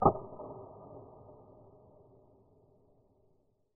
dungeon echo; amb; angband

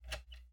cabinet open; angband

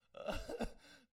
man sob; angband

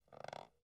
floor creak; angband

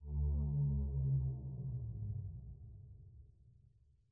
ghost moan; angband

